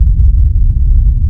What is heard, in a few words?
rumble